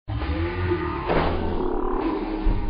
HOO UGH

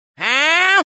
WAA; reverse

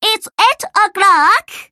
clock

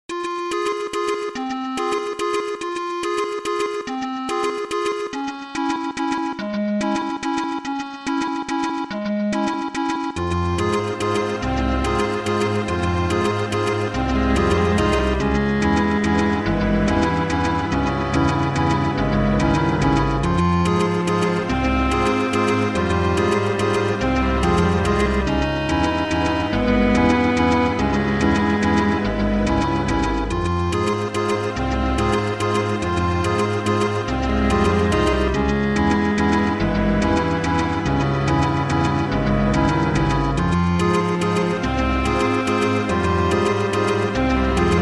tense; kirby

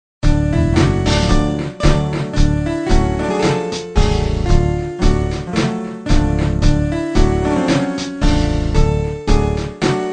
wrong backing

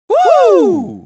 woo; cave

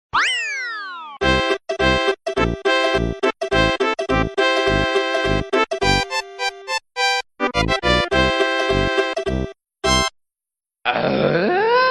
course cleared

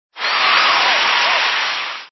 crowd applause

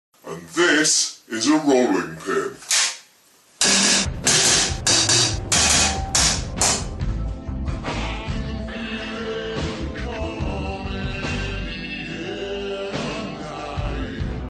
rolling pin

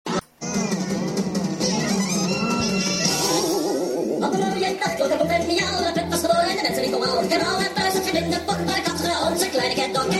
chant; catdog

